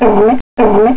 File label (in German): robbe alt; geraeusche tiere